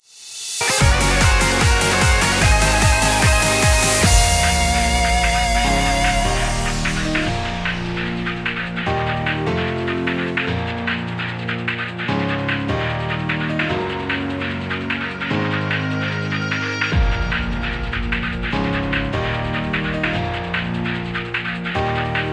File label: Victory Jingle 149 4-4; Athletes